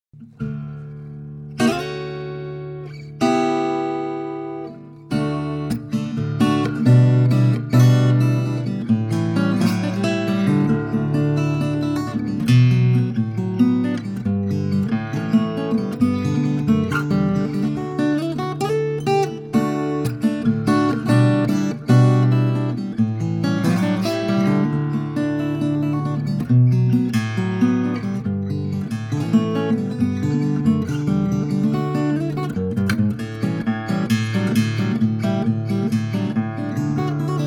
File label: Guitar Sample 6b